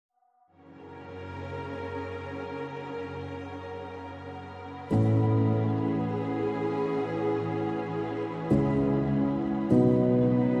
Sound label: No Drums Reduced Arrangement